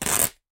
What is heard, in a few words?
rip; shweets sim